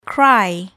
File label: cry